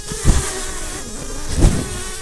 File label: bumblebees, Pascuorum queens